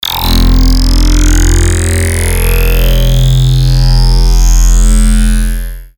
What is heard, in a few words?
long robotic impact